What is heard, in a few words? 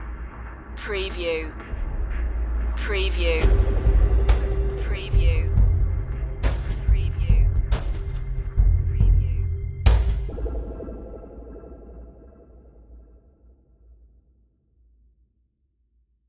ethnic movements stinger